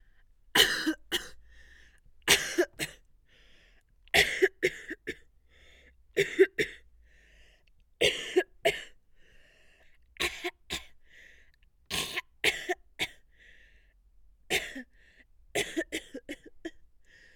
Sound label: Female Coughing Variation